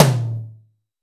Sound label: Boss DR880 tom; drums